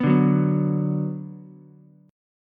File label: F sharp Suspended 4th Sharp 5th, Ukulele; strummed; F#sus4#5